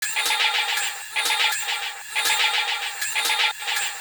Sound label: build up loop